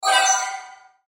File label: Musical Shimmer Robotic; Musical Sound Design; Blastwave